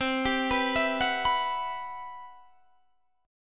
C Major Sharp 11th, Guitar Standard; CM#11 arpeggiated

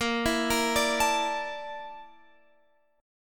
A sharp Major 7th Flat 5th, Guitar Open F; arpeggiated

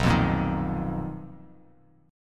B Major 7th Flat 5th B Bass Standard; strummed; 7,8,8,8; JGuitar

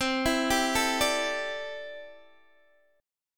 C 6th Add 9th C down Guitar DADGAD

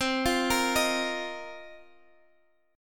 F 7th Suspended 4th C

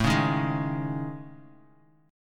A Major 7th Flat 5th, down Bass Standard; AM7b5 strummed; JGuitar

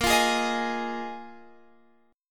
A sharp 7th Suspended 4th A sharp; A#7sus4; strummed; x,13,15,13,16,16

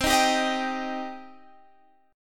C Minor C down Guitar Standard; strummed; JGuitar

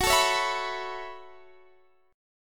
F sharp Minor 11th, Guitar, Open D

